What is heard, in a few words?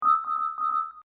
Hip Hop Dark Rhodes Licks 90 Bpm Swing E; Keyboard Loops; Lynne Music